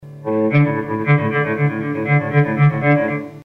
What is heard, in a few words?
Pedal Tone